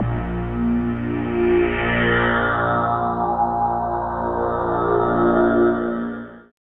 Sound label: SYN DANCE; Sound & Vision - Gigapack II